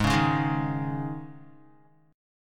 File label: G sharp Suspended 4th, Guitar; G#sus4; strummed; 4F,4A,5C,5G,5C,6E; 3,4,1,1,3,x